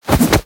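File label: clear combat grab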